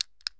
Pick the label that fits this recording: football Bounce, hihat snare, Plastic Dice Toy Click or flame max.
Plastic Dice Toy Click